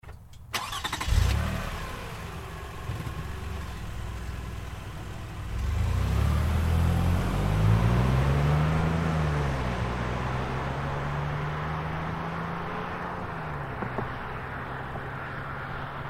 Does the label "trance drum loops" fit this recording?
No